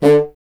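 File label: wind contrabassoon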